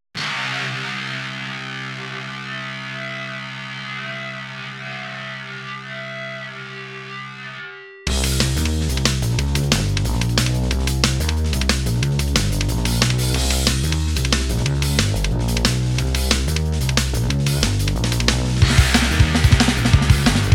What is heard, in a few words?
acidified speed